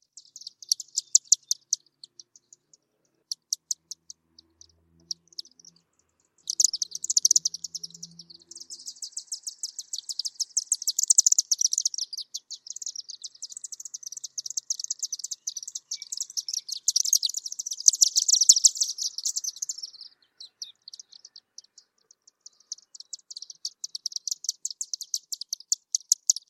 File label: chimney swift